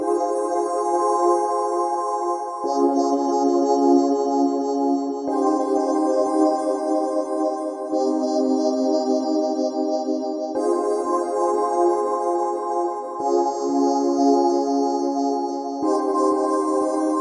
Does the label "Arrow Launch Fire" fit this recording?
No